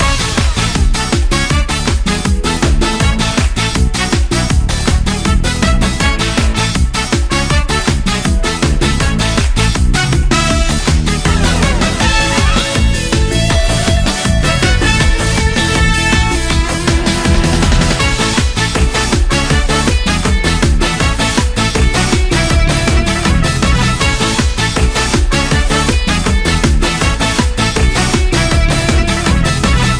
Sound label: Summer Event Music; pixelworlds